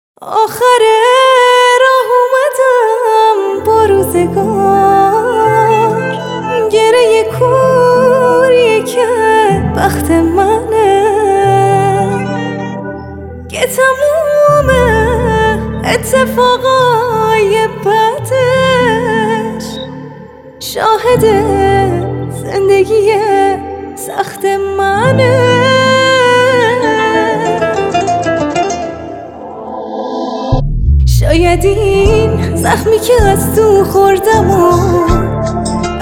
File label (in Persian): صدای